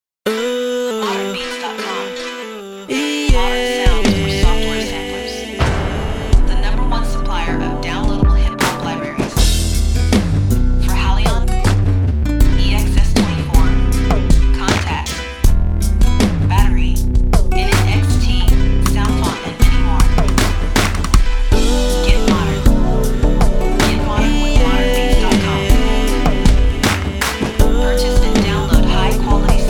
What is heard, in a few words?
RnB Keyz Chopz